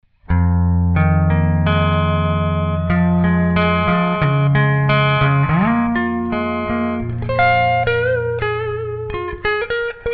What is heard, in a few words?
classic clean